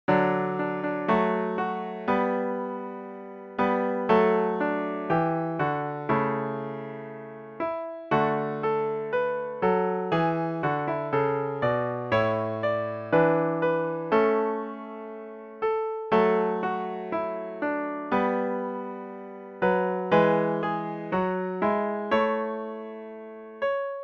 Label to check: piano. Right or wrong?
Right